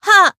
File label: Voice attack; nagi